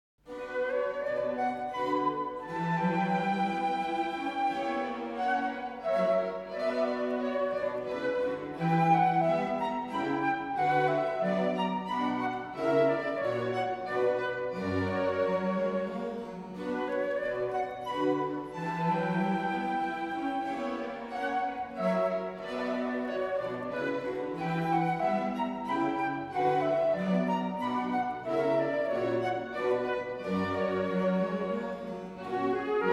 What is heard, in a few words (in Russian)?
КАМЕРНЫЙ ОРКЕСТР; КЛАССИКА